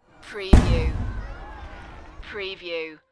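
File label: Big Firework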